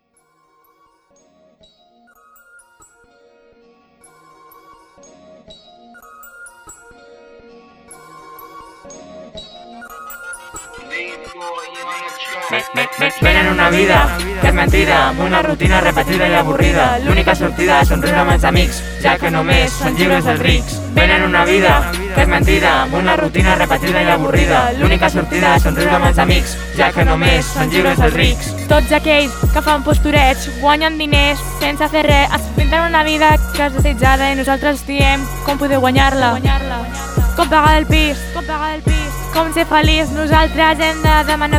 124 BPM

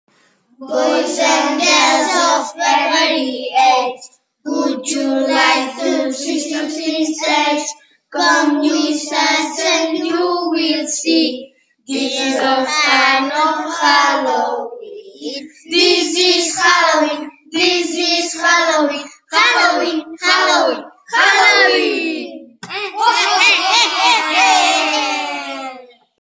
halloween song; racons competencials ci i 3r halloween

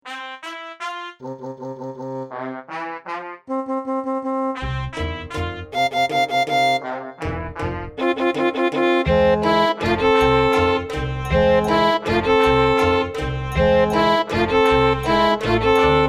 karaoke

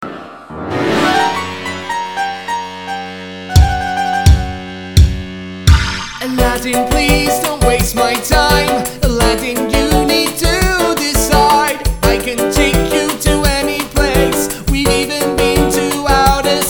WITH VOCALS

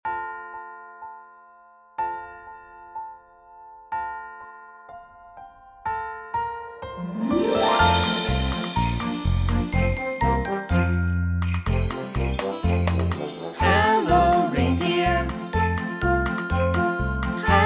Childrens Christmas Song

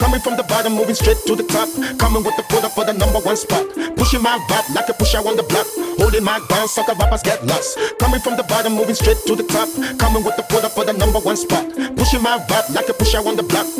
Electronic

